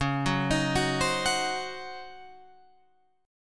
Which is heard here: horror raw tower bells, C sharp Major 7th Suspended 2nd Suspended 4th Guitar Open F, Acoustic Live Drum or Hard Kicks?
C sharp Major 7th Suspended 2nd Suspended 4th Guitar Open F